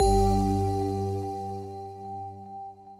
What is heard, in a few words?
prize bonus; Great Book Of Magic Deluxe; Wazdan